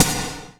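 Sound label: Trap HH, hi-hat; Sonic Foundry (Sony Creative Software); Esoterik Beatz; Trap Kit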